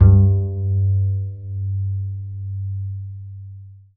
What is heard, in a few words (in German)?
KONTRABASS; Alpine Volksmusik